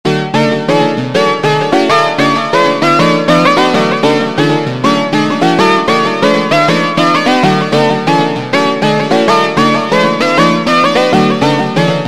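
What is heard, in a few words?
instrumental, phonk music; funk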